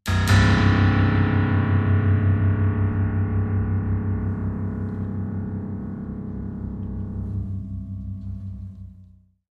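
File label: Grand Piano Strings Deep; keyboards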